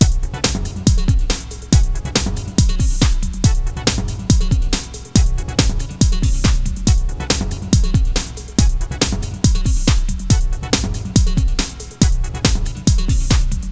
Backbeat; Icebreaker 1.0.5; Icebreaker Music

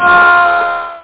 Falling; Knockout

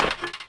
Glass Step, Footsteps; Urban Chaos

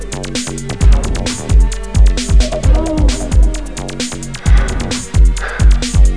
electro; Guitar&Electro

